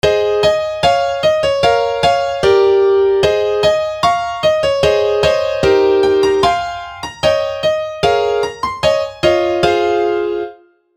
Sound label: chords cool; cool melody / chords